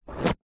carrot slice knife; zapsplat

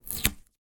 sticker small peel off remove from wooden surface

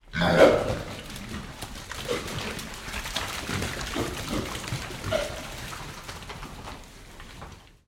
animals pigs in shed wet mud shed run scared

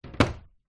household large wooden window shutters close; zapsplat

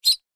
animals bird nestling or chick tweet

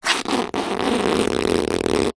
foley cardboard small pieces scrape together; zapsplat

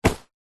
foley clothing shorts thick cotton grab

